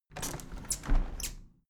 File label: window handle plastic squeak close tilt regular; objekte; fenster